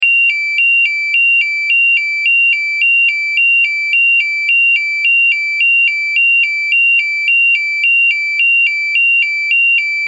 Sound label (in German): Ton